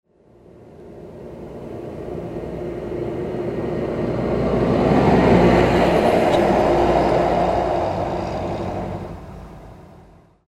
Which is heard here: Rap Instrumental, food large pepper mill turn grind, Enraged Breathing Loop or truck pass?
truck pass